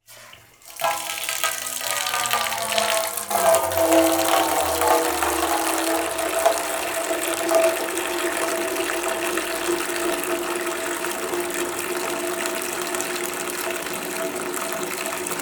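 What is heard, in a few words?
bucket sound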